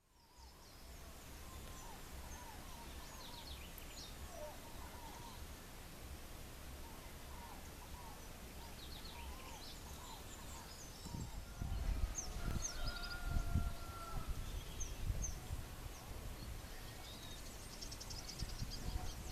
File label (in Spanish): ave de Barinas forpus passerinus y thraupis palmarum, aves de Venezuela